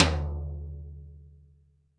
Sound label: TD-20 Tom A, Roland TD-20 Toms